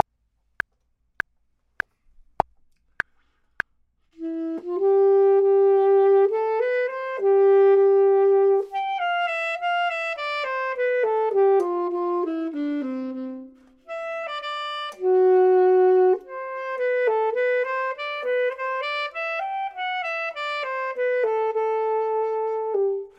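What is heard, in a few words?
top, Saxophone and Clarinet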